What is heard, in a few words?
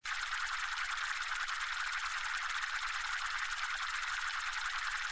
Spin, SLOTS; Pinata Loco